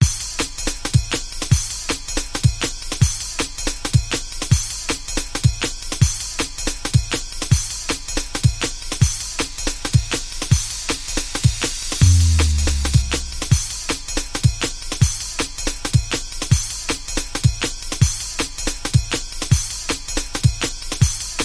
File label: Strange Siblings Beat